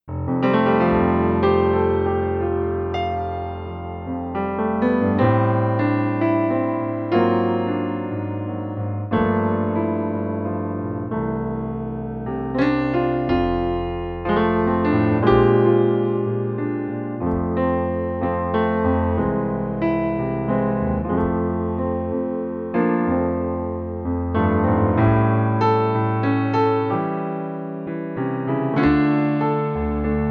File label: Piano